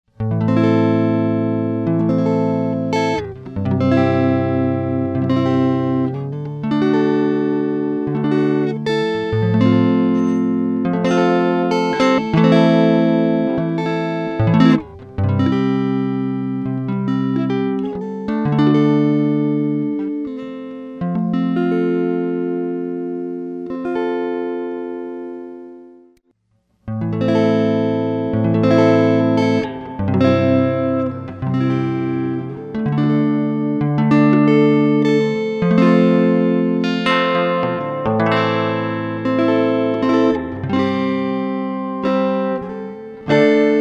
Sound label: silicon driver clean guitar reverb; dr zee; spring reverb